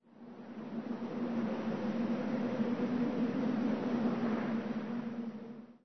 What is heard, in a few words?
Wind, terrain; Kings